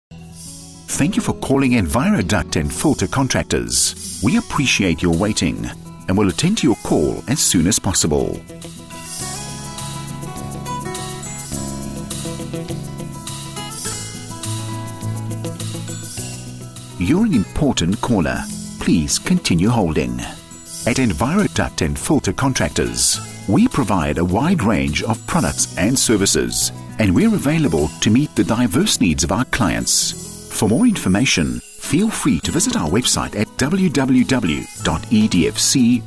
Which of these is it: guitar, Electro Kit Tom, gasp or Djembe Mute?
guitar